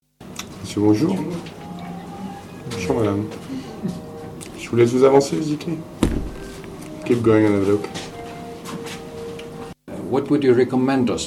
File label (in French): ambiance cave; chateauneuf